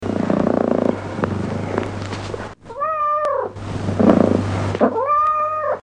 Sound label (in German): katzenschnurren